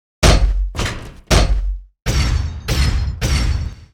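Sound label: keeper phantom knight death; monster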